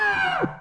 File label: disguise die